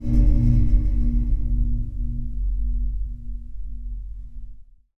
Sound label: Metal Groans; Heist